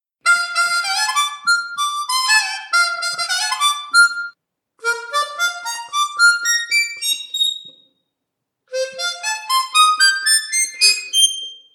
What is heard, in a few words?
lick example; Seydel